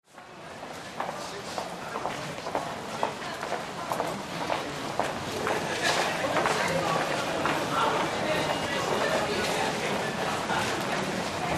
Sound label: Train Station Crowd